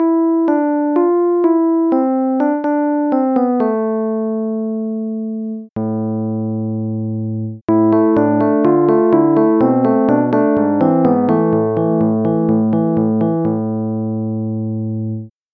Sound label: rhodes ep; SpectMorph Evaluation #4